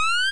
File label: 8bit kit jump, 8 Bit Videogame Kit; LO FI and 8 BIT KITS